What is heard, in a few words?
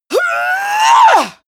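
female combat attack long, screams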